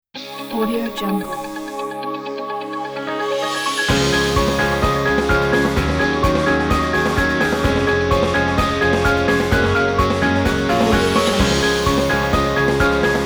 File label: motivational freedom ident logo